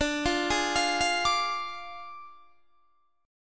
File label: D Diminished D, Guitar, Standard; arpeggiated